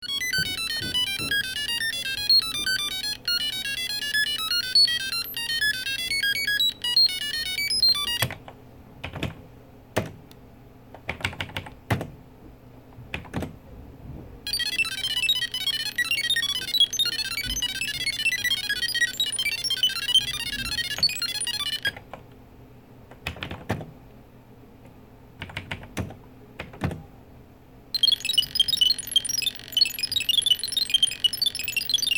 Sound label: trs80 rando beeps